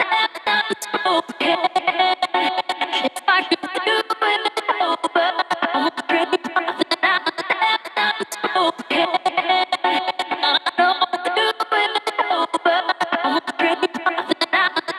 House Loops, Vocal Loops House 128BPM

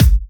Bassdrums

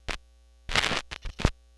Record Noise, Vinyl Record; Record Noises

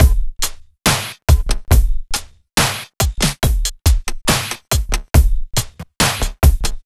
Slomo Beat 140 BPM

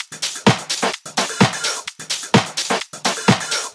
House Loop, 128 BPM; Vengeance Samples

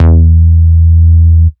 Root E, Bass

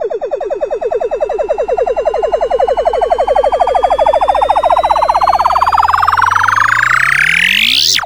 Sweeps Long

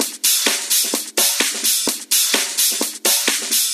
Minimal Loops

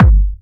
Bassdrums UK Trance Kicks

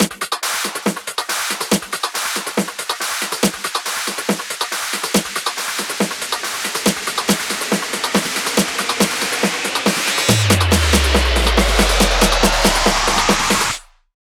140BPM 8Bars, Hands Up Trance Fills; State; Vengeance Samples

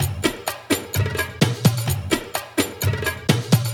Latin House Loop 128 BPM; Vengeance Samples

